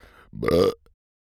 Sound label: burp; OPENAIR; Anechoic